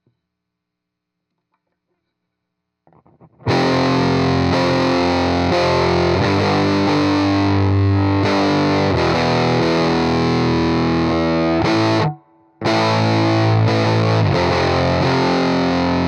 Heavy GTR

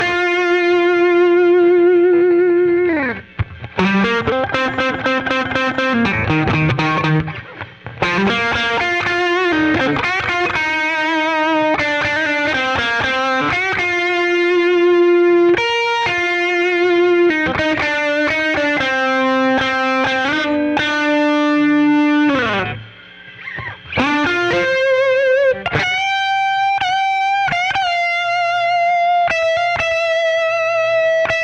Solo Gtr

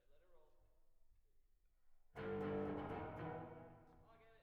Kit L

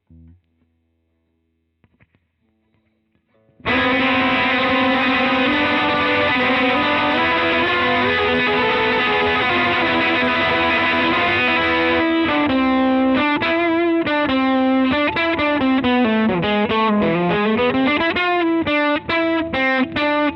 Gtr Solo

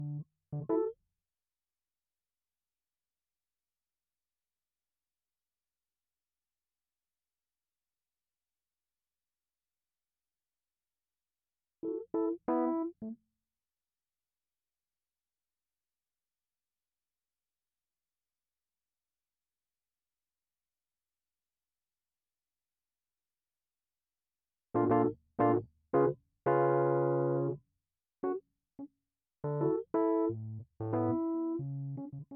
Keyz R

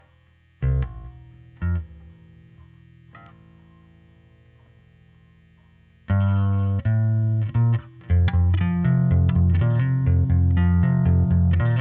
Bass OD

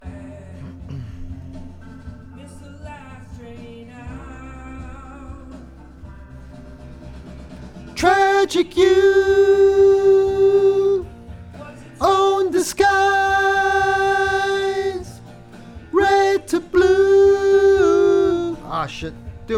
VOX Back

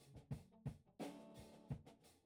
Bass Amp

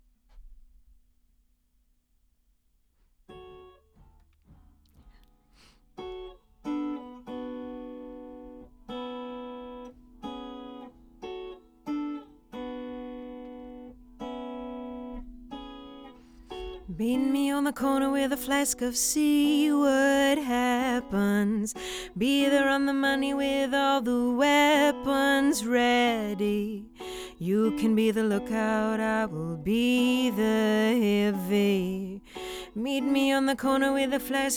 Ld VOX SM7

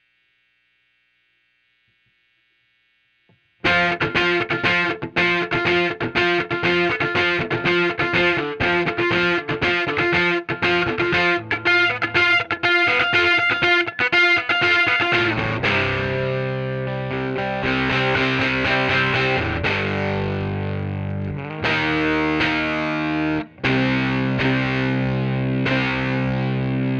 Gtr

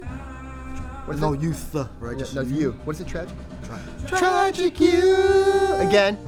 VOX Back

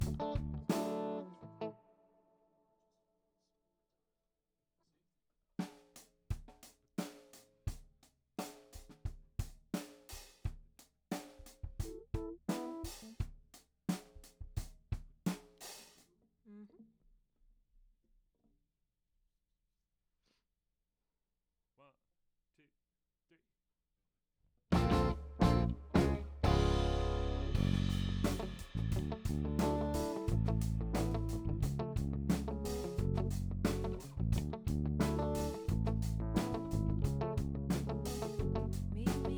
Rough Mix L

